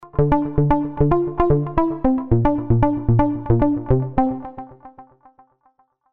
Tubesynth Hard n Hollow

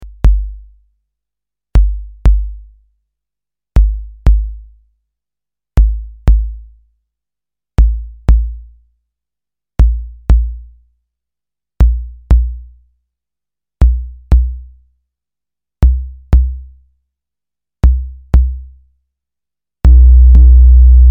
TB2 TOM

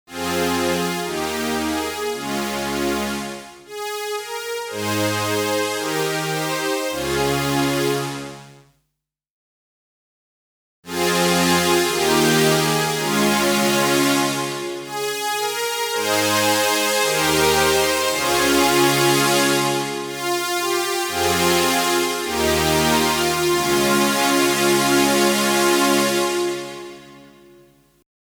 Roland SDE 2000 Flat Echo

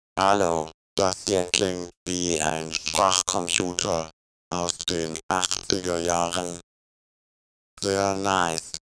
Waves Ovox

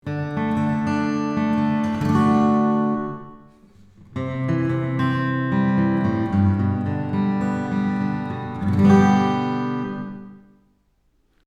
MixPre 10M Ac Git Room

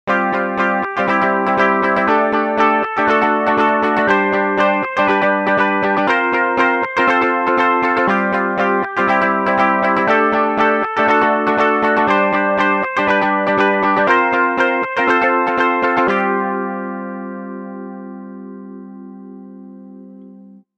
Guitarist

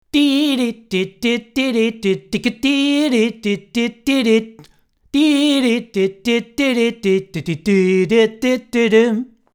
Camden neutral

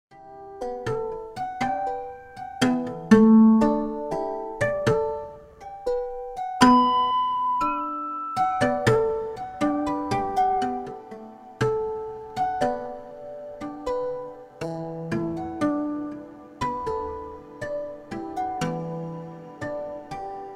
DRP-20 cathedral

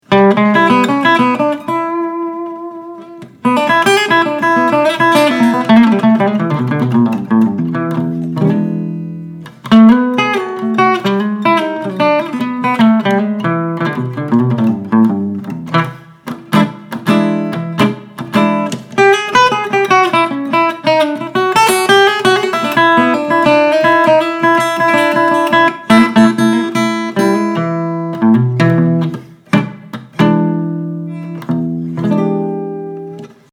Gibson L-7 Bluesy

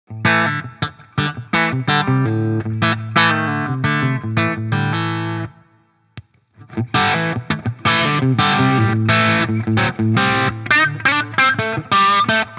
crunchy; Prince of Tone

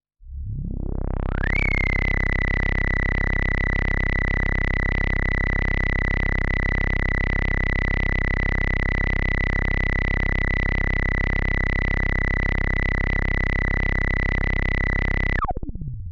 Behringer K2 Resonanz 2 kHz Flattern

